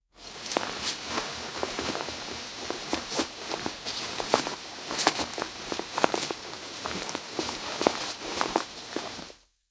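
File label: jeans rustling while walking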